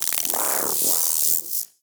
Venus Robo Fish Small Caught; Warframe

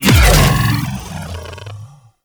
Grendel Deluxe Consume Impact; Warframe

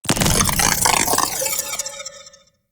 Reanimate; The New War; Sentients

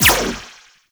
Choir Erupt Firing Eye

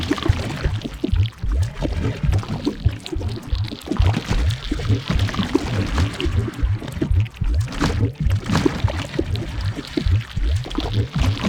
Rising Sludge Loop; gameplay; Warframe; NineteenNinetyNine; Stage11FuturePast

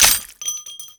Modular Primary Handle Reload, Guns; Warframe; SU Modular Guns; Reloads

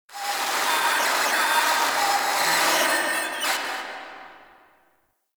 Dojo Tech Beep; Warframe; Mod Quest; Teshin's Hideout

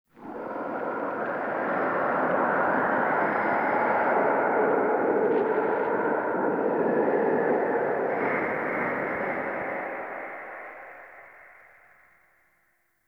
Whispers Dark; Warframe; Ambience; Entrati

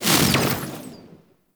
Proj Explosion; weapons; Noctua

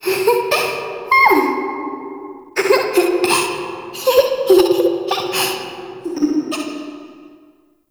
Recorded Children Misc Amb; Ambience; Dungeon Island; Duviri

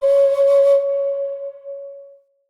Flute Alt C Sharp Lower; Player Instrument

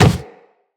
Operator Run Wood, Footsteps; Characters

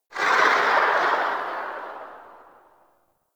Pistol Tail; Warframe; Sapient

